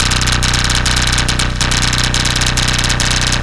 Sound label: Aggresive plucked bass, instrument samples